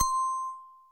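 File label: bell C3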